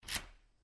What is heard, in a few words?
lock door; norden-sounds